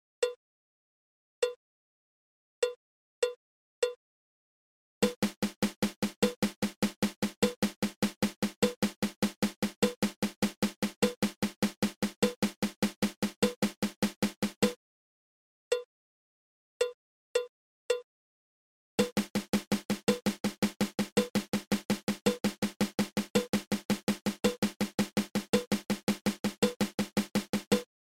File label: Triple Stroke Roll